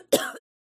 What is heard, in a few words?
cough single